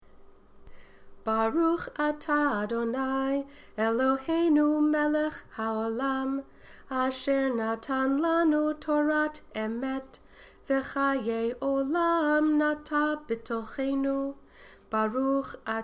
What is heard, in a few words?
Blessings After Torah